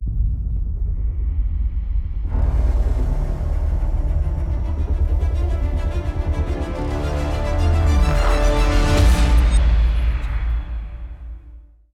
Epic Logo

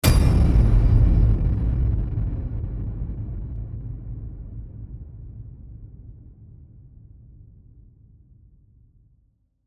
Epic Low Hit

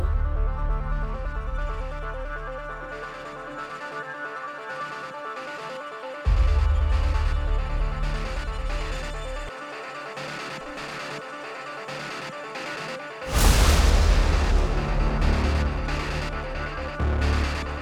Epic Action Intro

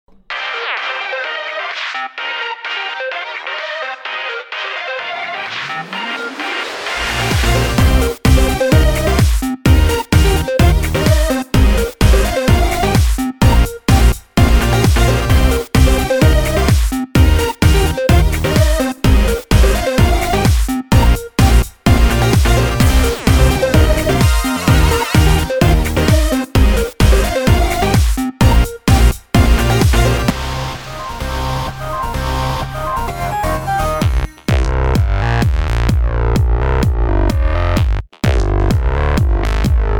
Energetic & Fun Party Pop

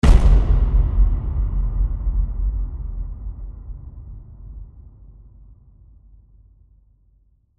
Epic Low Hit

